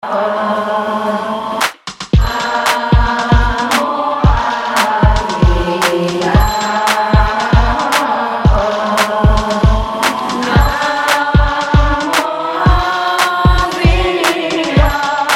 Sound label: asian colours